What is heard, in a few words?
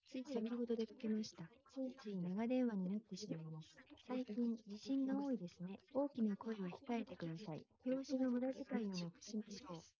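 female, instantaneous mixture; SiSEC11; underdetermined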